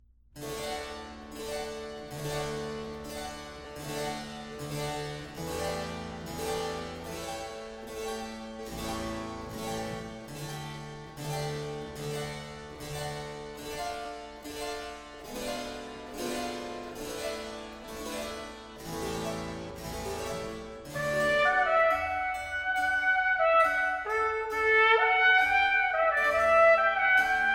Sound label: Concerto In D Major, Larghetto